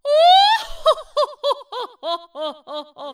laugh; queen

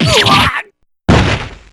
"uwah"; p4u; adachi

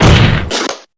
slam special; diego armando

